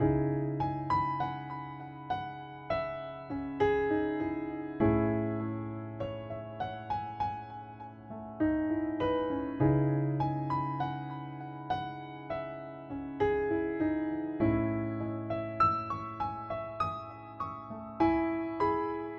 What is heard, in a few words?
Piano Loop 100BPM C#min, classic; 99Sounds; Monosounds; Music Loops; Instrument Loops; Pianos